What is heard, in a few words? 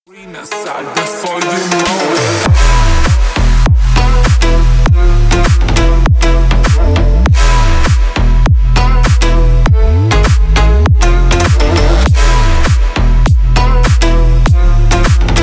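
good loud ringtone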